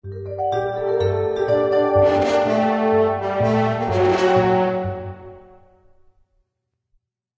Match Found

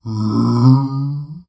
Dino Groan

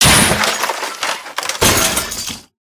Chair Racer Crash; ZCorp

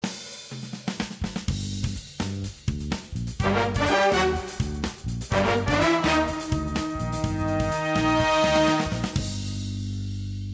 Battle

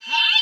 staff slam

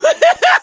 Streaming Waves

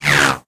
Snowball thrown

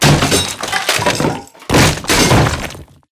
Zcorpion Dead

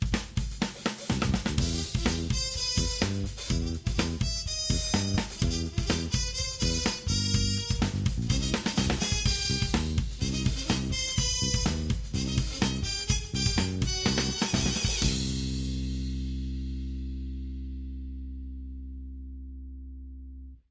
Battle

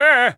Wizard Pain

